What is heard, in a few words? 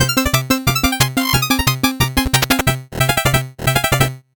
8bit jingle fast